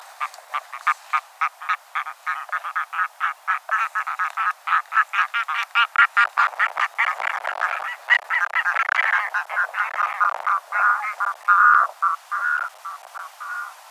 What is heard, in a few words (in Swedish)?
Smalom